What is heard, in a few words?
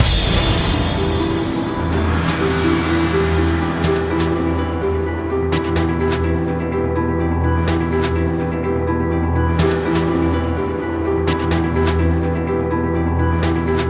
News Tension Bed